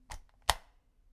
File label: machine; ドライヤーたたむ